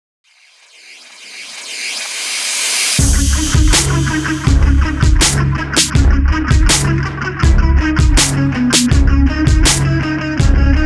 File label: Guitar